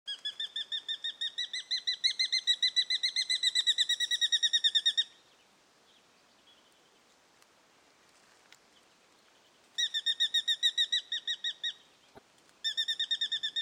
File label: Tachyspiza Fasciata; Brown Goshawk; Call